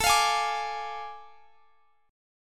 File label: A Major 7th Suspended 4th Sharp 5th, Guitar, Drop D